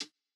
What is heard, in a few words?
Jazz hop hihat